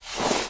Knee shuffle; Lara; Playable Tutorial Level

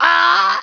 Monk Shout; Playable Tutorial Level; TR2

